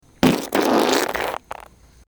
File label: long morning fart